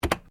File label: KEYBOARD COMPUTER SINGLE, Computer keyboard typing input; Electronics